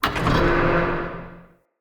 Vent Open; Encounters; Ode Pollution Tower

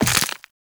walk bone, footsteps; Warframe; Operator

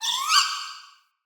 Vocal Boost, Animals; Player; Vocals; Player Floppet

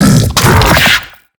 Hand Bomber Idle; Warframe; MiTW; Battle Barks

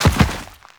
Land Dirt; Enemies; Footsteps; Warframe; Teshin

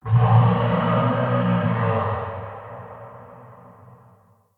Swamp Low Moan, Ambience; Midrath Island

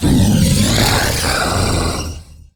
Death; Warframe; MiTW

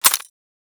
Rifle Mech Secondary; Warframe; Tenno; Tenno Bard Rifle

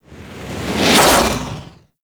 Melee Swipe; Warframe; Teralyst; Ropalolyst; Abilities

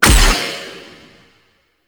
Shot Fire; weapons; Noctua

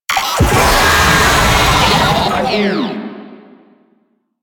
Proto Infested Babau Player Spotted, Vocals

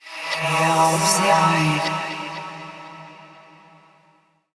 Hand Compass Vocal; Warframe; Duviri

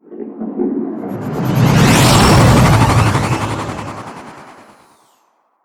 Fly By Exterior; Archwing Intro Cin Liset; Warframe; Cinematics

